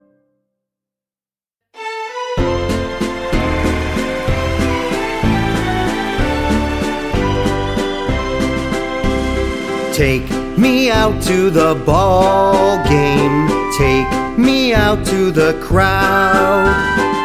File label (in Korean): 영어남, 동요, Take me out